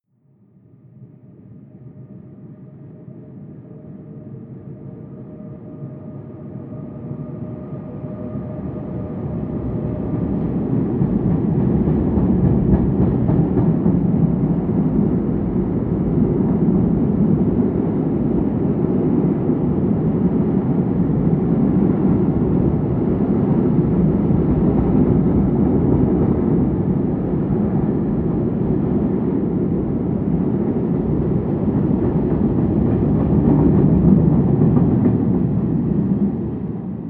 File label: train freight drive by distant